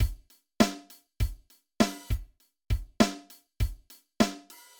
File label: loop dr 100